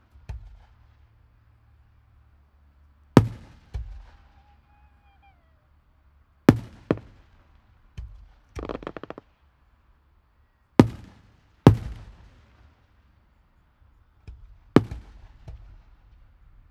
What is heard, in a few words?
fireworks; Ambisonics